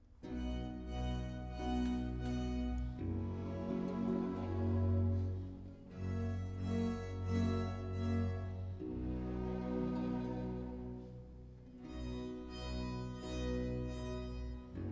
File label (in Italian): Sinfonia; Scena 12; Antonio Vivaldi (1678 - 1741); Vivaldi - Tito Manlio (2005)